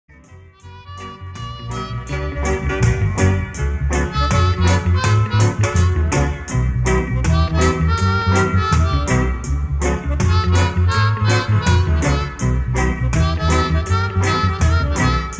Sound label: Western Organ